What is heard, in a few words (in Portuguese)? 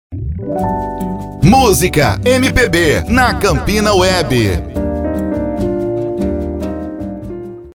VINHETA MUSICA MPB